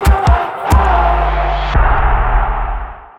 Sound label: sound logo; scudetto; figc